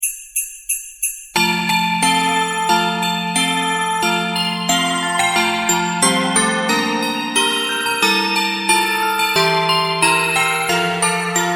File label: Jingle Bells